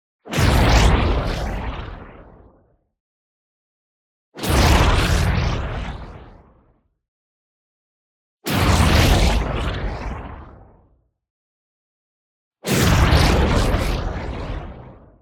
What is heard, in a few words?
ABILITY DEACTIVATE; Neutron Fury; boom library; Matter